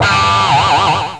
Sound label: Chwang Floppy; Total Distortion